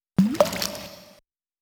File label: tiny fanfare; Taito Digital Sound Archives Arcade Vol.4